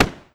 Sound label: Ar Fire; Cruelty Squad